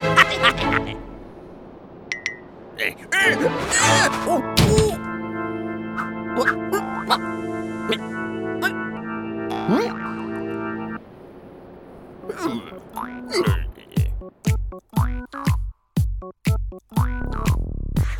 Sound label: ~A Catchy Beat~; Cinematic; Escaping the Dance